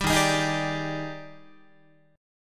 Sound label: Eb Major 11th, Guitar; EbM11; strummed; JGuitar